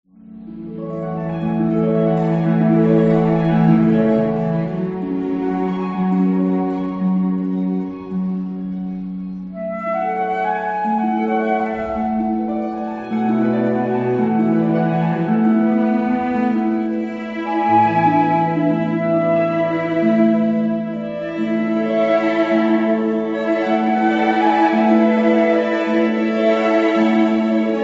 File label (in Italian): Quintetto; J.Cras